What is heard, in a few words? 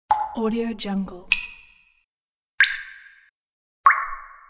waterdrop